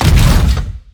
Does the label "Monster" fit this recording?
Yes